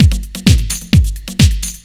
LOOP; SoundFactory; DRUMLOOP

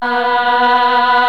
CHOIR, SYNTH; SoundFactory

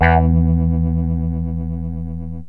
voco bass, yamaha rm1x synth

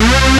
RAVER; SoundFactory; SMPMANIA

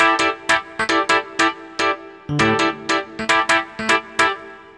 Electric Piano

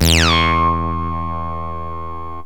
bl dist, yamaha rm1x synth bass and lead

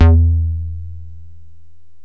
square bass, yamaha rm1x synth

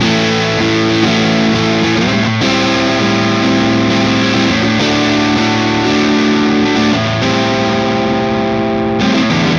Electric Guitar, Loops; Guitars

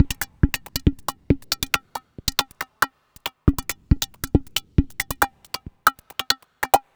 Electronic Percussion

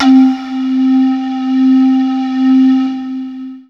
HARD PAN; SoundFactory; SMPMANIA

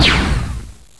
laser; Deathchase; Retro

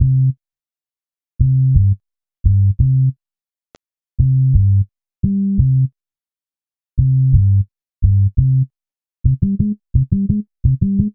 Synth Bass; Basses

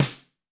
Snare One Shot, Drums

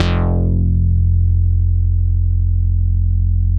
ELECTRO, BASS; SoundFactory

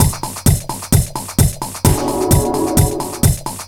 Dance Drumloop; Drums; Dance Beats

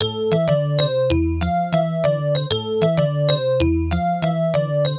Electric Piano, Loops, Keys

